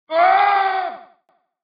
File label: fall